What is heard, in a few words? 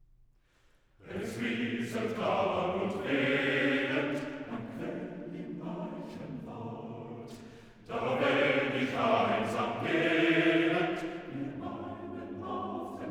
quartet for unaccompanied male voices; Die Einsiedelei I ("Es rieselt klar"); D. 337; Schubert; Songs for Male Chorus